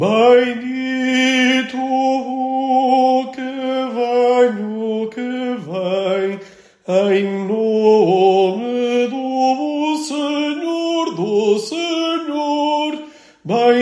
Aleluia versículo Tenor

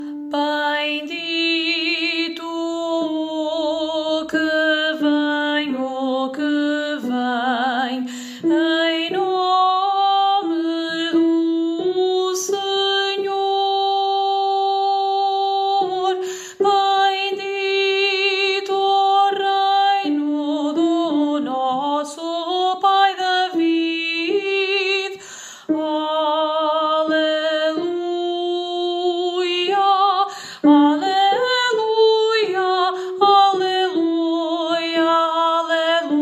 Versículo Aleluia Alto

